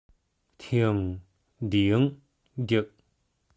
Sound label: ding